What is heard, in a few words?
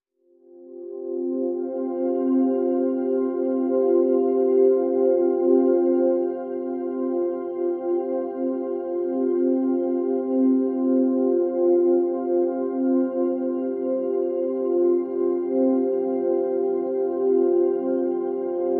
Pads Lifted (F)